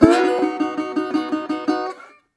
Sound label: Blues Guitar; ILIO; Fretworks - Blues Guitar Samples; 120 SIMMS LICK